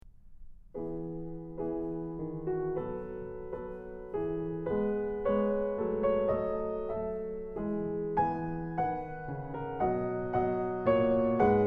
Waltz In E Major; Magrath Book 09

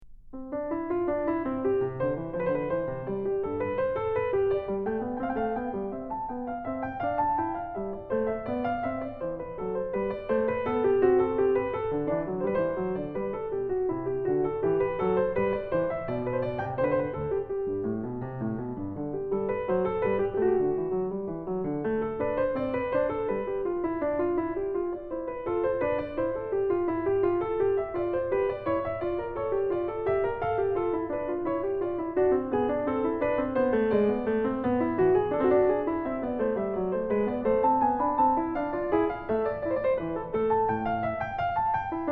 Invention in C Major; Magrath Book 08; BWV 772

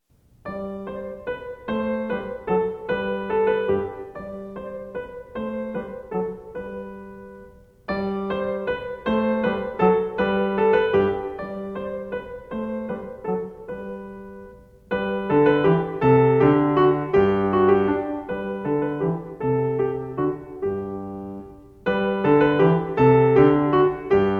Petit Menuet; Magrath Book 03

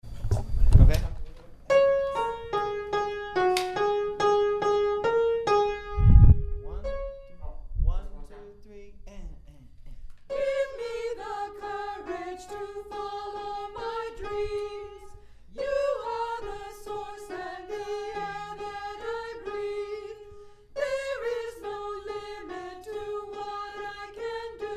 Soprano

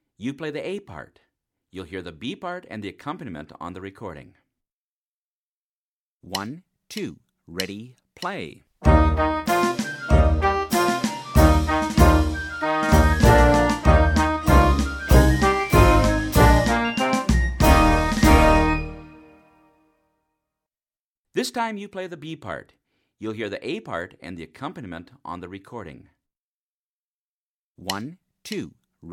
Play-a-long, Duet, qn=96; SOE Bk 2; Yankee Doodle; trumpet

